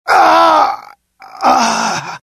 death, pain; mohaa; dialogue

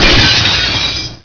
glass break; hurrican

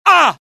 death, pain; dialogue; IT; mohaa

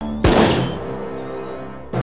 death; singe

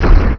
gatling; hurrican

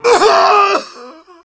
death; mohaa; dialogue

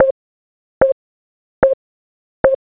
keypad blip, buttons; xash3d fwgs

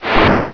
stone fall; hurrican

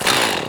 chainsaw switch; cdogs

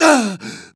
damage; mohaa; dialogue

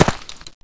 player dirt; xash3d fwgs

